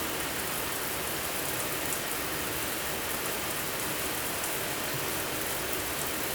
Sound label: shower; SnootGame